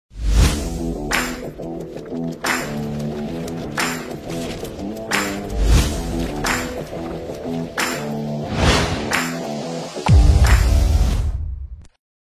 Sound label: INTRO URBAN ROLLER